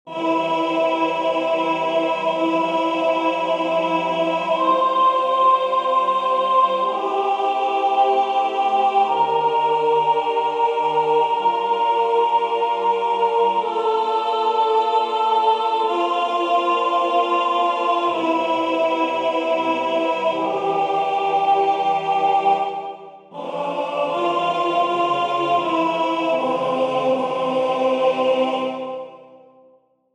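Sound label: Presence 4 part soprano, wisdomchant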